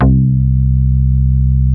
C3, DIGITAL BASS; GENESIS; Music Store - Claudius Diemeter Schwerlos

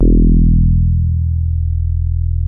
DEEP FM E2, DIGITAL BASS; Music Store - Claudius Diemeter Schwerlos

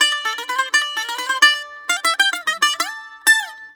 128 BAG, BAGLAM, Mediteranean Traditions; PH BAGLAM

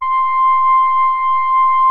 MOOG SOFT C5, LEAD; Music Store - Claudius Diemeter Schwerlos

